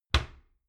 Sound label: door wood close heavy